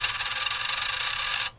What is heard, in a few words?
cartoon spin stop